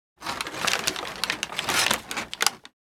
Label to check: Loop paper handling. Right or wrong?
Right